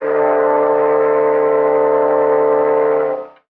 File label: steamboat, Horns; Notes